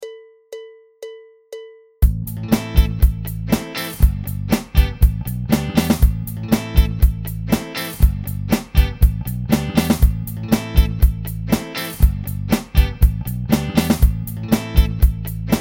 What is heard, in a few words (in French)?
debutant groove